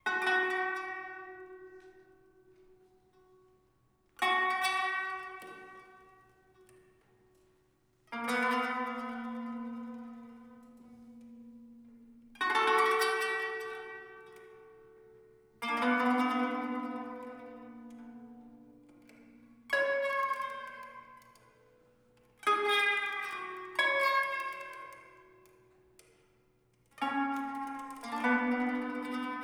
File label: Improv Interlude; Didem Basar; sound of montreal